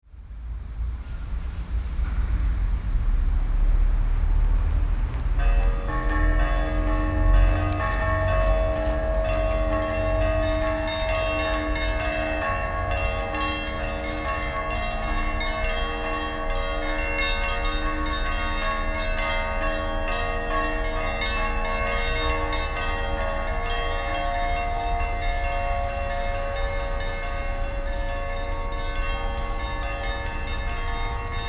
City ambience with church bells ringing; Urban, Town, and City

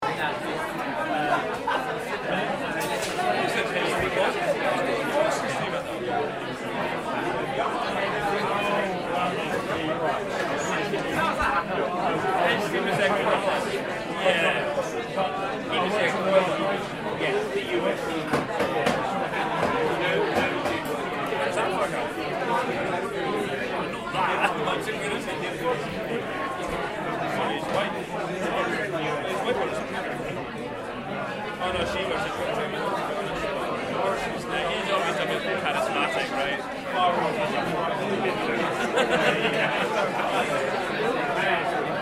Busy crowd voices background chatter; city; Urban, Town

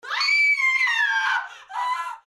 Female screaming woman in pain

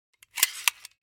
Stapler stationery office handling

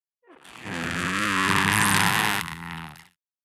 Mouth sucking squeaks; misc human

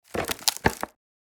Wicker basket lid lift and open

